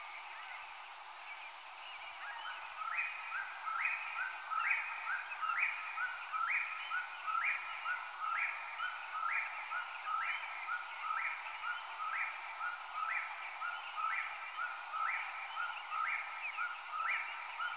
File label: WHIP